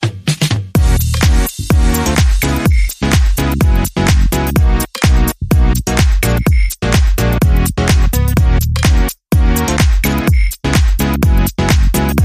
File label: WAH WAH What